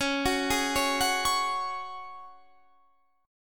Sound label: Db Suspended 4th, Guitar, Standard; 21,21,18,18,19,21; arpeggiated